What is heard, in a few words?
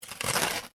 cardboard rip; Cardboard Ripping / Tearing; Objects; McKinneySound